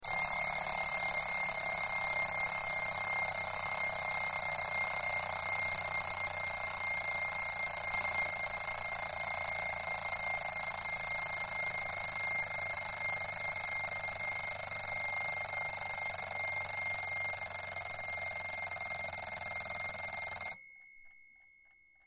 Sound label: alarm clock; BinaryDigit; gametimer